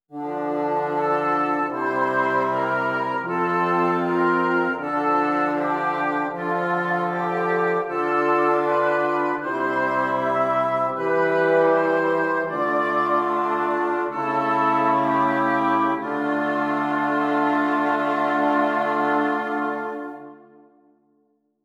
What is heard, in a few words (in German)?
IV Stimmiger Contrapunkt; Ronge; con le correzioni